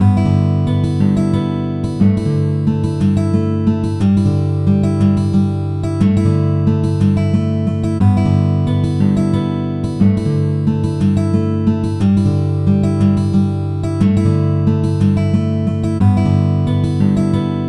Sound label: Acoustic, alarms; LG Qua tab PZ LGT32